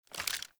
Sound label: Pistol Reload Idle; Warframe; Tenno; Lasrian